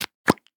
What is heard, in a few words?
Pill Bottle Open; Medical; Blastwave